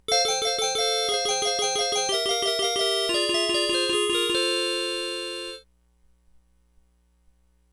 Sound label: Mother Fairy Music; Pac-Land; PlayStation